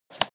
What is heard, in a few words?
leather latch open